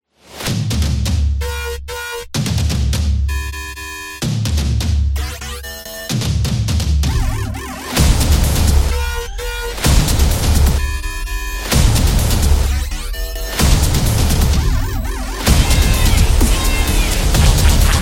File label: Dubstep Drums; high energy